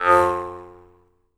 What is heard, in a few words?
jaw harp, Pitched Percussn, Jaw Harps